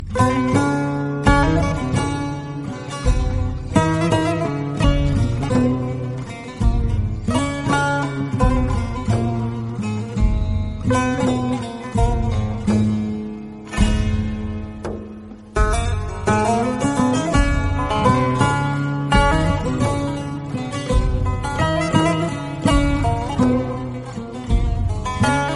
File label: turkish guitar, ringtone